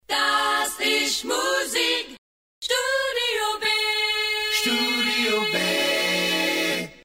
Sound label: Musik Acapella; studiob